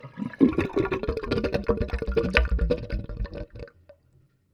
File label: Bath Drain; Bathroom